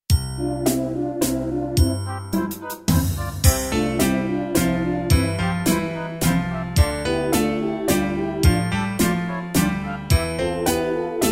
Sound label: waltz